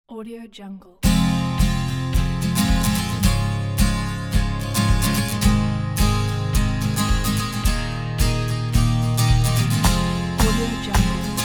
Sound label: warm acoustic pack